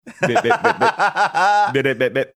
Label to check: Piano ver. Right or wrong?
Wrong